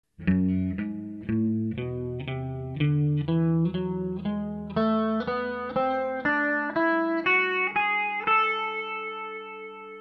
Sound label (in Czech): Ami melodicka, kytara